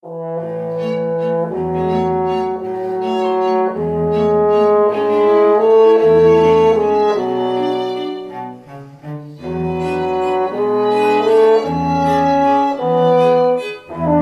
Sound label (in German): Klingelton Horn; Android